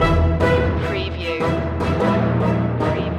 neon nightscape distance loop

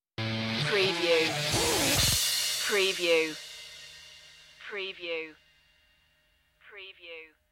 burn out stinger

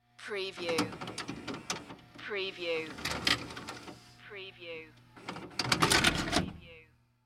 VCR Eject Tape

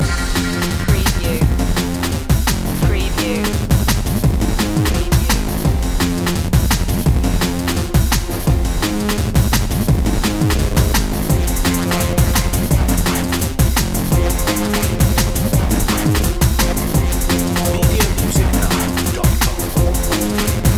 high contrast loop

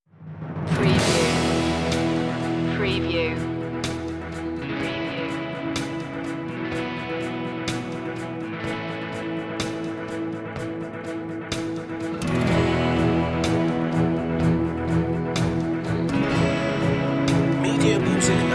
no marching snare

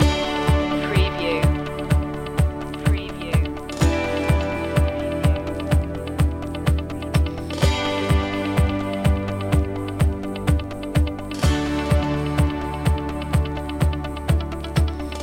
vacation road loop

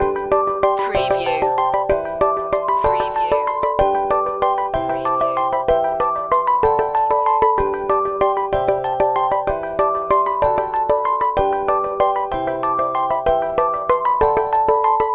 light signals loop

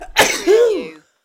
Sneeze

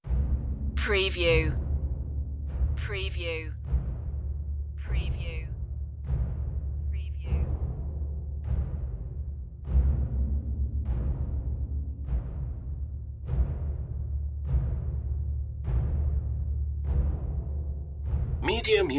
perc

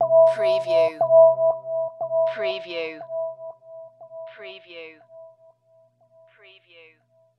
High Evil Tone

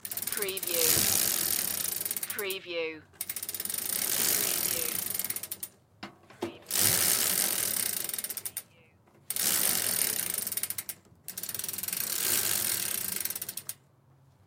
Bike Chain Spin Fast Multiple

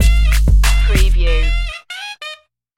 beat the sax stinger

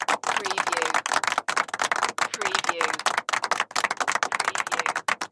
Applause Medium Group; sound effects bible

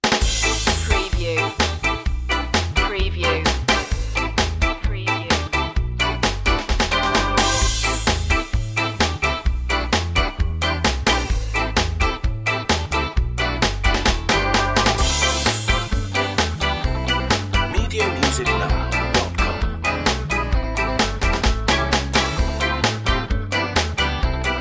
a minor ska no horns version

